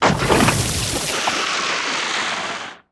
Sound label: poison attack target; viper